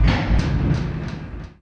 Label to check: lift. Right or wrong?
Right